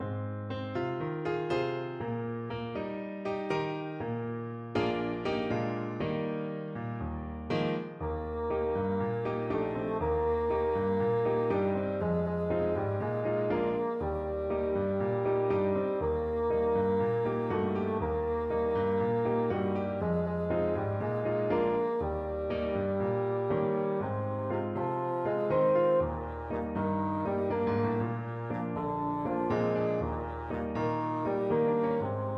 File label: bassoon